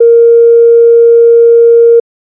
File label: fm sine no modulation